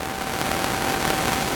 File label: Electro Magnetic, Hum, Noise And Glitches; CB Sound Design; Defect